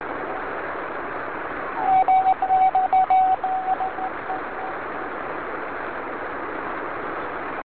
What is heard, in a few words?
burst; n1bug